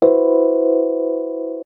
D&B Keys Stab; neuro

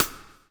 BLUES TK, Backbeat; Spectrasonics - Backbeat

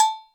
Agogo H, Yamaha DTXpress; drum machine; Percussion

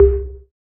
SAND, Drums; Distored Reality 1&2

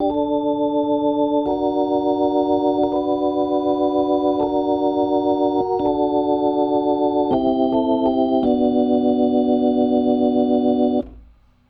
Soul Organ Riff, 70s Analog Funk

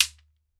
SHEKERE, African Percussion

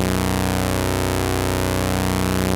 2600 F#1, ARP 2600; Hollywood Edge - Giorgio Moroder Rare Synthesizer Collection

